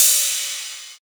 Rides, Cymbals

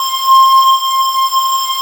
FUZZ PIPE; Spectrasonic Distorted Reality 1; FUZZY PIPE

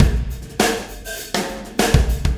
Wormhole Beat, Processed Drumkits

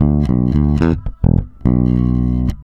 REAL BASS, BASSLICKS; Ueberschall; Jam Box

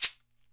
CABASA, Brazil Drum Perc

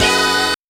stabs; stabs & hits

